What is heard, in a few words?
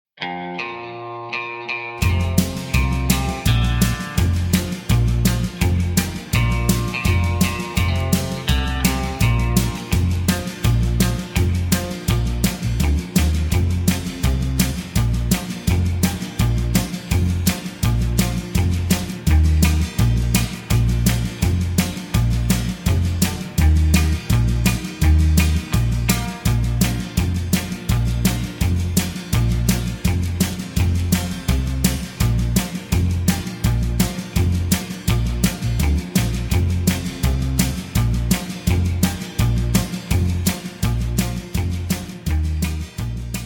Bb No Backing Vocals, backing tracks